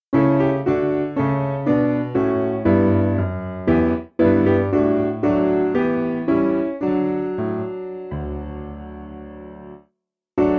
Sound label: Swedish Piano; Simple Piano; In The Springtime